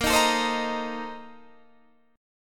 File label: A sharp Major 7th Suspended 2nd Suspended 4th A sharp Guitar Open D